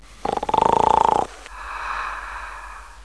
Player Sleep; Mythos

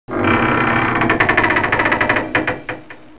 creak; Heretic II Demo

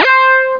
Blip; 11,128 sample rate; MandelMusic

